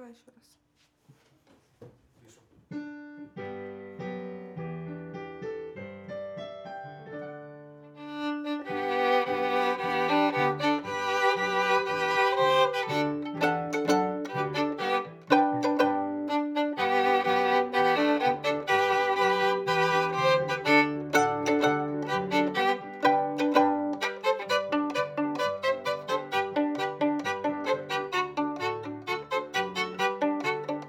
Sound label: Viola C